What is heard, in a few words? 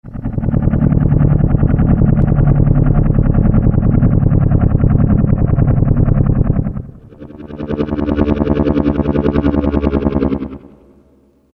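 sfx helicopter; Factory Presets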